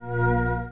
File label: bonus; Lilanci